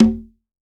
CONGA, STEREO CONGA; AKAI S-Series CD-ROM Sound Library VOL-5

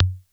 PER, TECHNO PERCS; Crypton - DrumBase5000

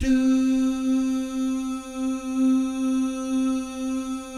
DOO GUYS, Vocal Jazz FX; Spectrasonics; Vocal Planet; JAZZCOMBO

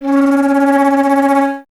flute C#; Miroslav Vitous - Solo Instruments VOL-2; Solo lnst. II

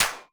Korg 01W MegaDrums; 300 Drum Machines

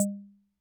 Perc, classic machines; arranger

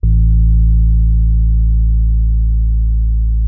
Rhodes MK1 A1; Xsample VOL-1

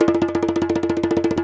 ASHIKO, African Drum; E-MU Producer Series Vol. 7 – Old World Instruments

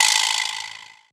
Old Skool House Perc

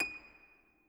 harp long, D#6; Best Service ProSamples vol.51 - Classic Orchestra 2

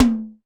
Percussion, Korg 01W; 300 Drum Machines

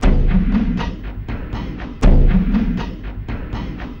Elektro Big Wu, Drums; Sonic Foundry (Sony Creative Software); Friendly Fire

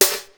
GROOVE KIT, ENSONIQ TS-10; Rubber Chicken Software Sample Bank

